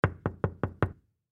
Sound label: Door Glass Knock; Office; Door Glass Knock Short; Objects; Blastwave